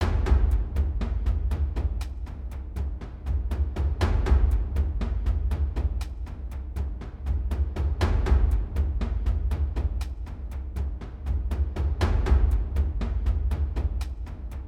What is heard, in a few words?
Perc symphonic; raji an ancient epic